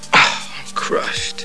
crushed

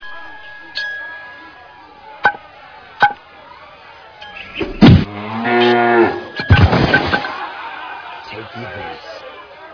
cow

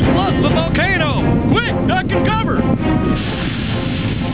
duck cover

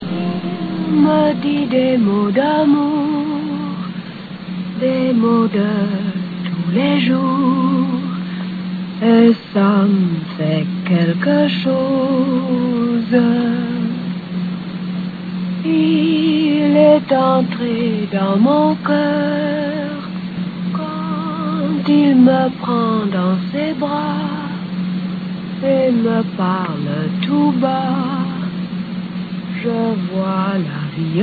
singing

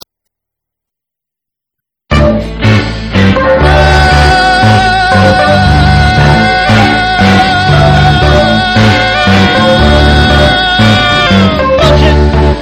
guitar; Guitar Wars

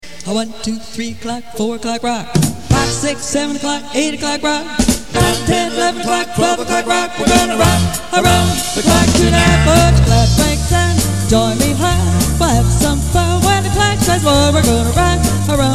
rock

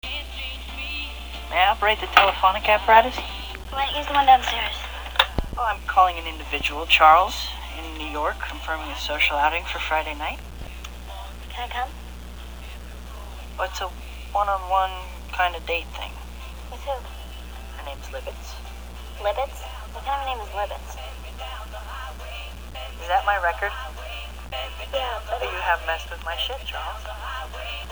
telephonic apartatus; Tobey and Christina Ricci in which Tobey asks to use the "telephonic aparatus"

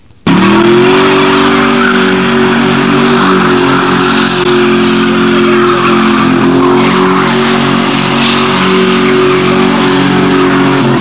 Mustang GT; Circling; Bahrain's most powerful Supra

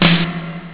perc; tin

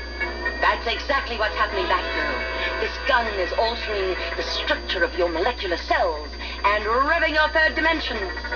revving; Batgirl; Dr. Cassandra

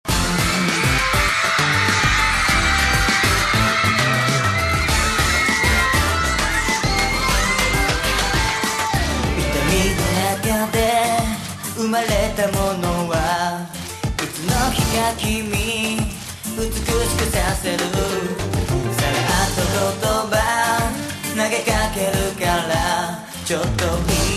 solo

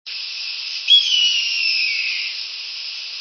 hawk red tailed, birdsongs

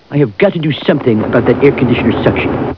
suction

